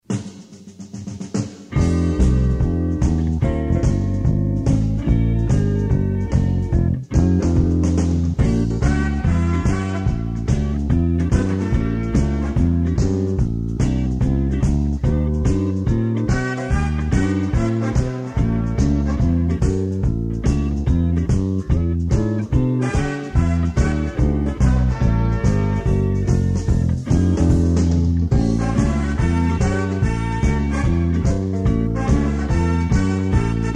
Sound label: blues shuffle